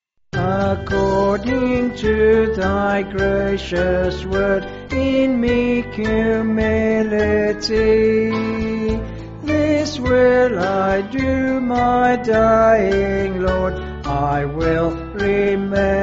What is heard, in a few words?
According To Thy Gracious Word, Abbey